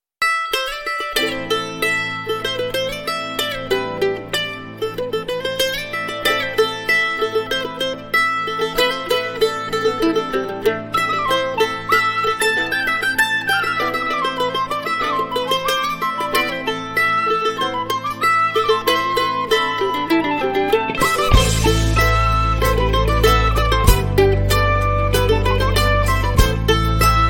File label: Shanty Mandoline; Folk